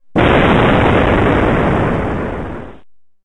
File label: Explosion